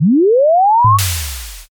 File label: warp jump